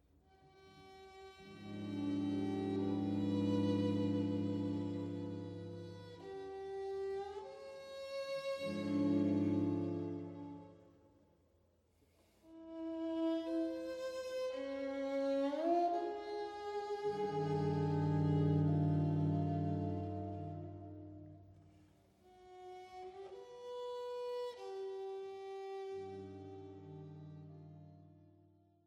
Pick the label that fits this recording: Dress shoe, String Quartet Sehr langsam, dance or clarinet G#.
String Quartet Sehr langsam